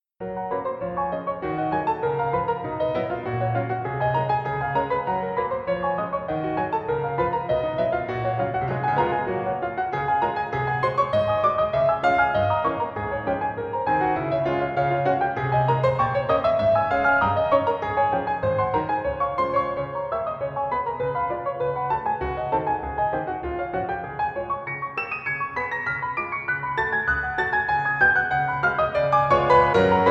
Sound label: Etude, piano